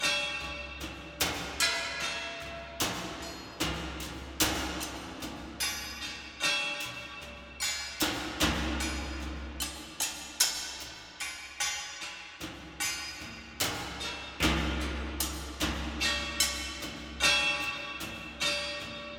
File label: poor district cautious; Shadwen